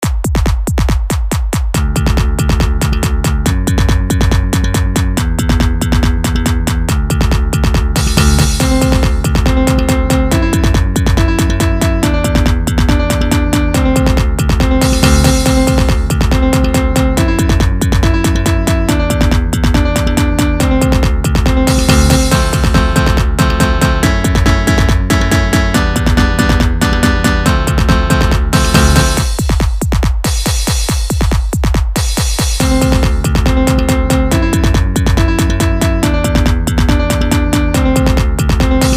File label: Techno song